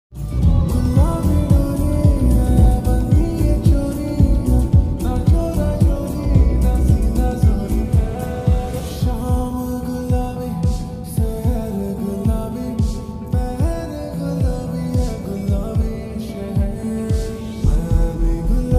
ringtone lofi ringtone song ringtone love ringtone